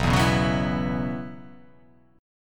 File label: B Suspended 2nd Suspended 4th down; strummed; JGuitar